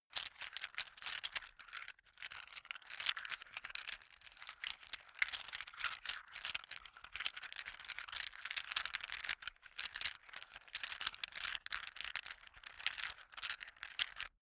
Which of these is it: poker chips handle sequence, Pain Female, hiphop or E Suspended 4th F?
poker chips handle sequence